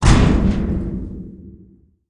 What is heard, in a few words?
Removable Impact